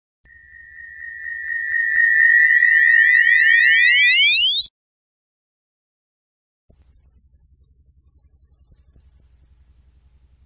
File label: hypnotica, Atmospherics